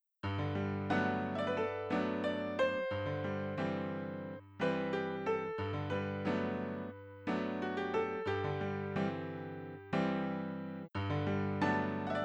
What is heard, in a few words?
Piano; Nichijou no Piano II; kuro no juusan gamerip